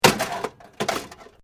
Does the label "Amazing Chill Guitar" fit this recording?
No